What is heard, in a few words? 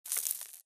imp bullet lightballistic dirt debris; Titanfall 2